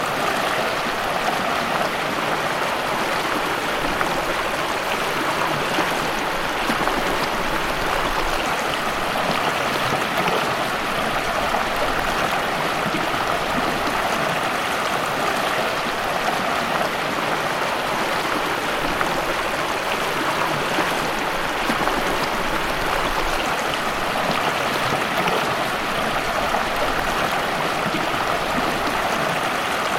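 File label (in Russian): шум горного водопада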